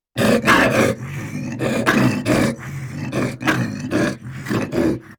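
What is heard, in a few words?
quick lion roar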